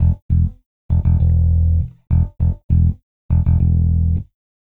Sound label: Slinky Melodic Gtr, Rock, Guitars